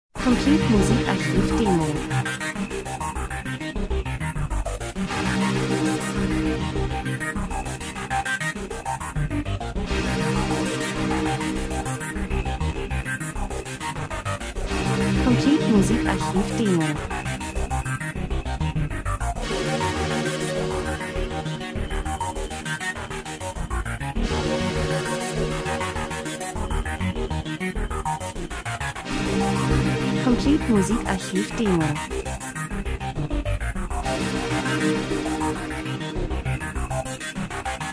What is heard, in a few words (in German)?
drums, rhythmus; Sequenz Tatort Mörder Krimi Spannung Spielfilm Tiefe Kälte Maschine Industrie